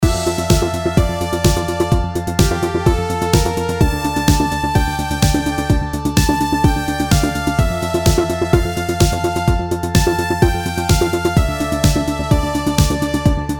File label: Lifeforce House